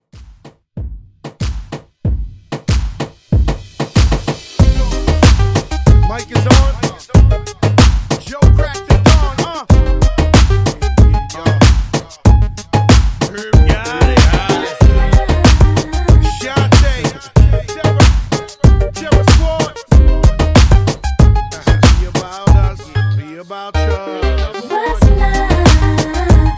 Moombahton; Remixfreakz

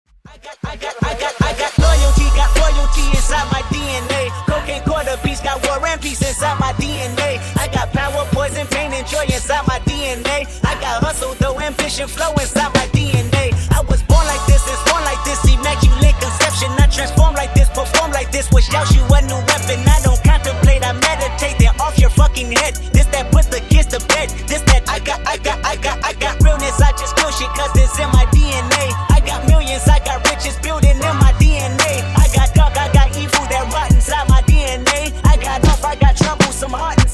Intro Outro 78 Bpm

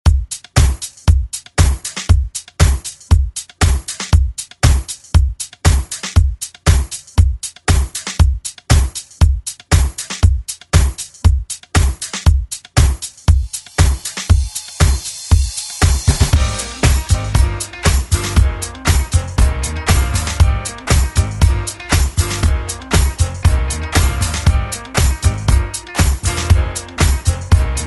70s ReDrum; DJ Mhark

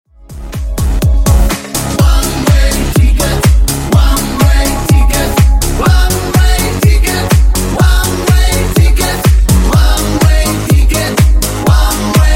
Funk 124 Bpm

